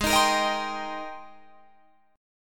Ab Major 9th Ab, Guitar Drop D

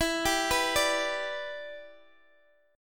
E Minor 7th, down, Guitar, DADGAD

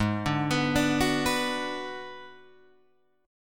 Ab Minor 7th Flat 5th Ab, down, Guitar, Open G

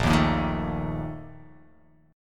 Bb Major 7th Suspended 4th, chord

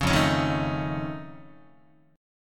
F Augmented 7th F Guitar, chord; F+7 strummed; JGuitar

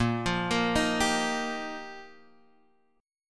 G Minor 7th Sharp 5th, Bb

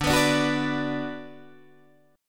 D 6th D down Guitar Open D, chord

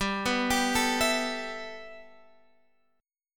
A 7th Suspended 2nd, G

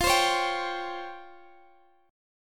C sharp Major Sharp 11th F

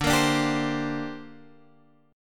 D 7th Suspended 4th Sharp 5th D

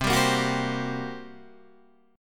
G Minor Major 7th Double Flat 5th, C

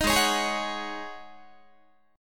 G sharp 7th Suspended 4th G sharp, Guitar

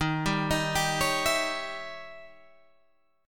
Eb 7th Flat 9th, Eb, Guitar, Open G; 13,12,13,12,14,14

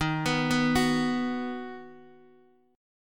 D sharp Minor Sharp 5th D sharp, Guitar DADGAD

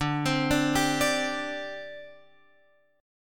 D 7th Suspended 4th D Guitar Open F; D7sus4; arpeggiated; x,9,12,9,10,9

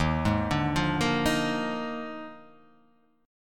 Eb Major 7th Suspended 2nd Suspended 4th Eb down; arpeggiated; chord; JGuitar

